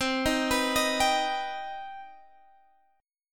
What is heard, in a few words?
C Minor Major 7th C Guitar Standard; arpeggiated; JGuitar